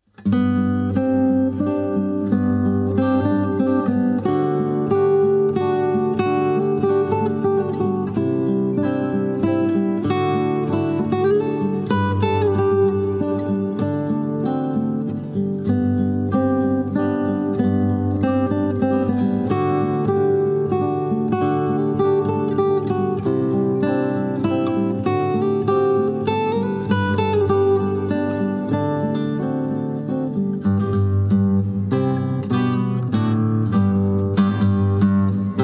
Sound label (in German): Fantasia (Instrumental)